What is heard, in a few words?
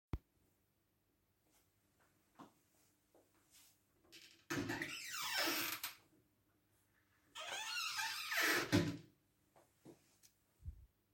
door of cabinet; باز و بسته کردن